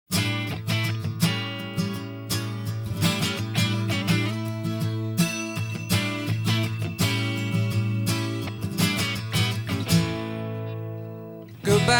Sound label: acoustic version